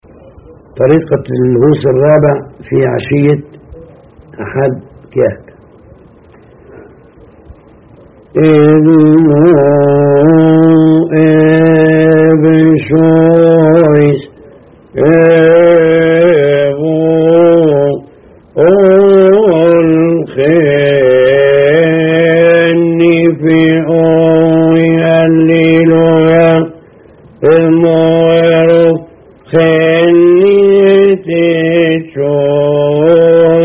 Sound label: Kiahk Vespers Tune; praise 4th hos